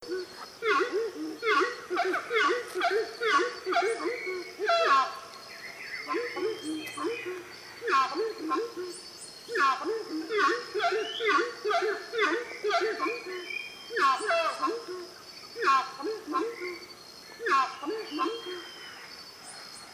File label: Horned screamer, پرنده